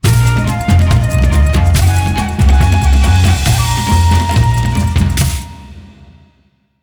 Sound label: Match Win; King Hippo